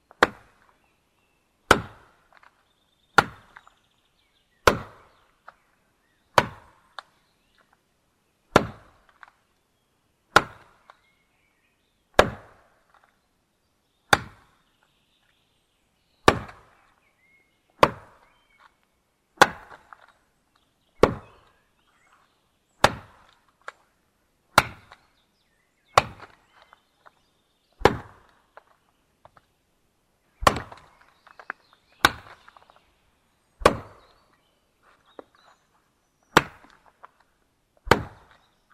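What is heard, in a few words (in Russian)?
звук топора по дереву в лесу; В лесу звук топора по дереву слышится не так как во дворе дома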